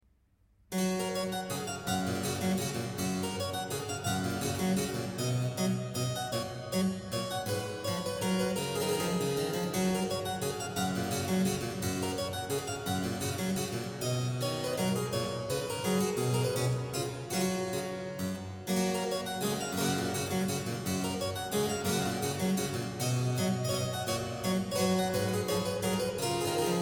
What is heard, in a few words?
menuet g dur; clavier buechlein fuer anna magdalena bach 1725